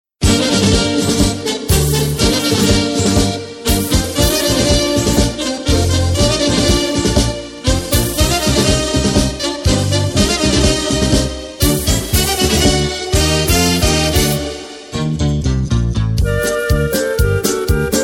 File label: flamenco